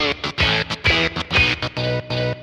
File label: keys, phonky loops